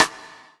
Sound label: Korg N1R Side Stick